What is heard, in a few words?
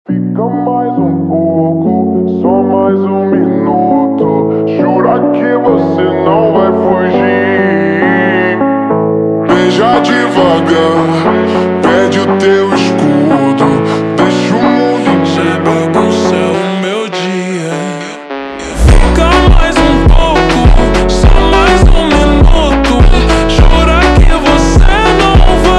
Montagem ultra Slowed